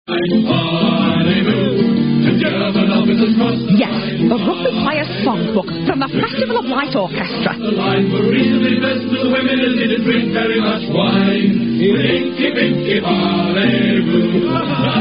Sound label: Rugby Songs; Clean; spitting image